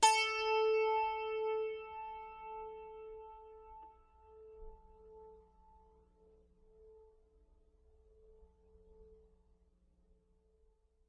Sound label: ZITH A4, zither; multisampled; world